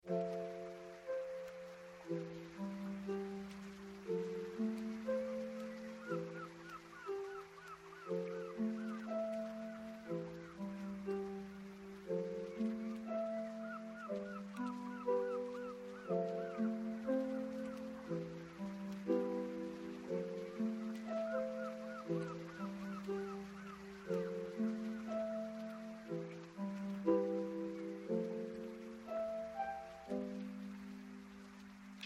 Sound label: BGM